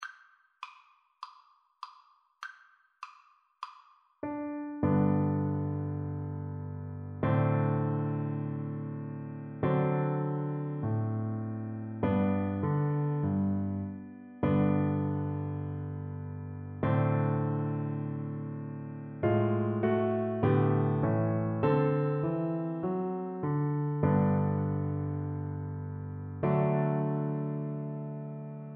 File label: America, Double Bass